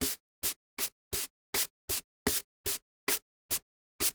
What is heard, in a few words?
Household Broom Sweeping Cement Floor Quickly Multiple Times